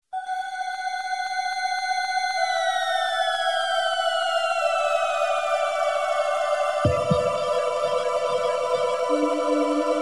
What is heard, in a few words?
End, Amiga; Alien Breed; Moonchild